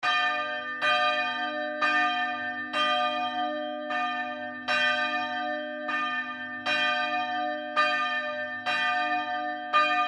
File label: church bell high pitch